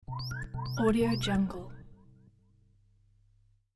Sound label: alien warning signal